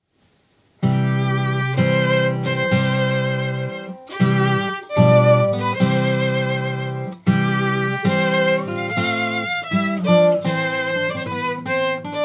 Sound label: guitar violin; Bridal Chorus